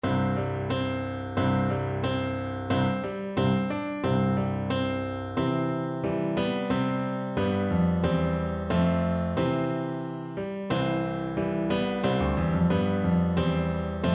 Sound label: grenadiers BN, Bassoon